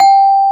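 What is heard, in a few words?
CELESTA G5, percussion; AKAI S-Series CD-ROM Sound Library VOL-8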